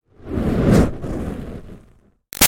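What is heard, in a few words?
Fire Torch Whoosh Fast Up with Tail; Flames; Objects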